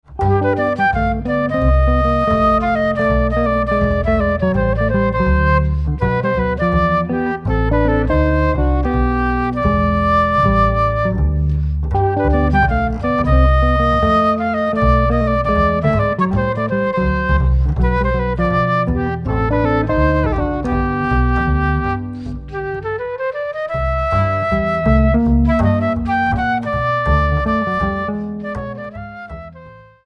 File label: flute